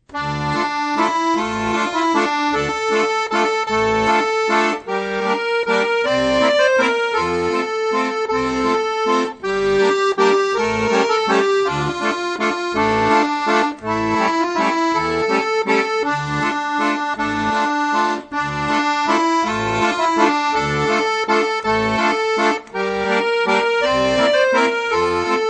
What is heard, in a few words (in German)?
Wer kann Segeln, Akkordeon; Die Achtelnoten; Akkordeon Workshop Band 1